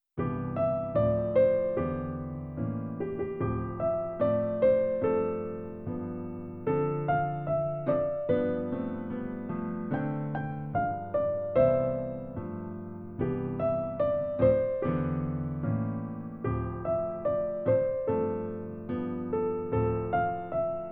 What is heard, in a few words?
Jingle Bells